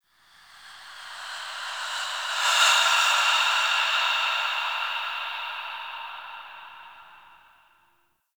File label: Breaths, Ambience; Warframe; The New War; Zariman